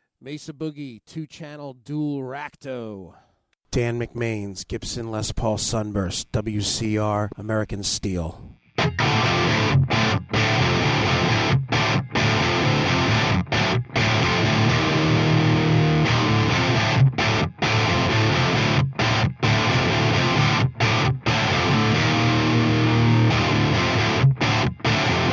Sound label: mesa boogie dual recto rack 2 ch bridge